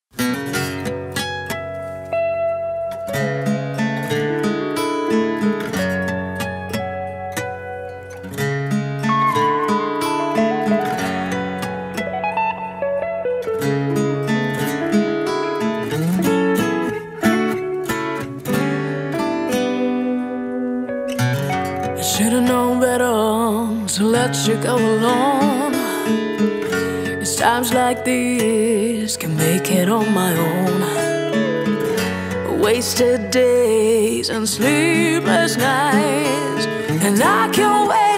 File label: acoustic cover